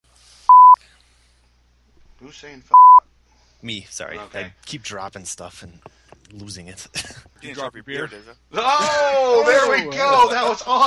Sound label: beer drop